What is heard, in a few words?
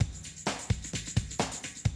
130, Classic DrumLoops